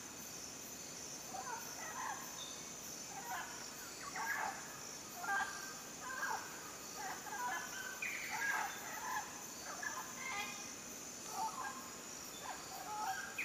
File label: yellow crowned amazon; پرنده آمازون تاجزرد